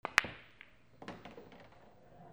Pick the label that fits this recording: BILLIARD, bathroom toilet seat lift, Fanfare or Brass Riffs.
BILLIARD